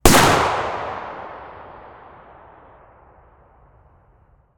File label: Shotgun Fire Slug, 12 Gauge Shotgun; TS Sound